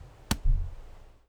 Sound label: thick low CHUK